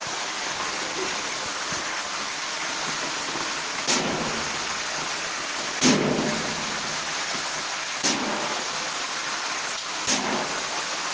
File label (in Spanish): Lluvia; FOLEYS